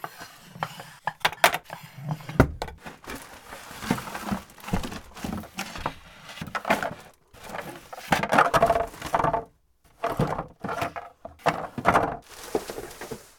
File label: Messy Attic Browsing